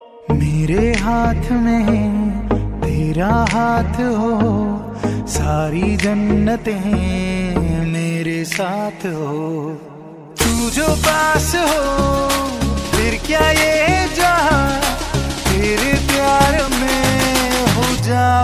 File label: Romantic Mashup